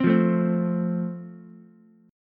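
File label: A Minor Sharp 5th, Ukulele, chord strum; Am#5 strummed; JGuitar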